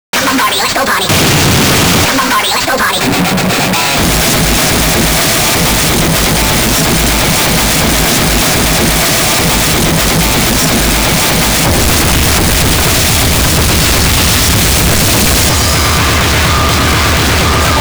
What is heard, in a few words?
hyperactive modern jazz; Fujimi Industry Records